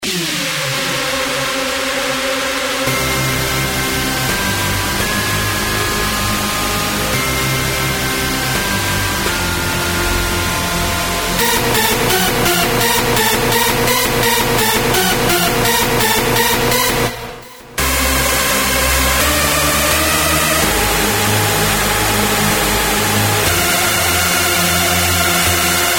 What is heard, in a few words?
aggressive leads